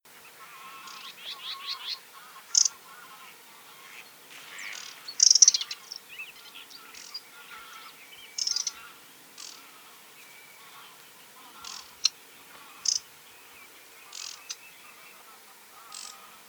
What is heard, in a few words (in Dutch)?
rietzanger roep